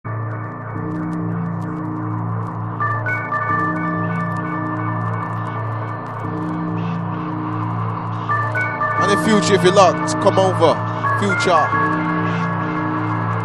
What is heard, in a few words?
Drum and Bass Jungle and Old Skool, UK Bass Radio